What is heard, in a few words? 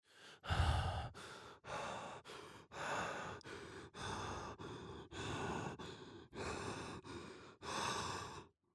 paniced breathing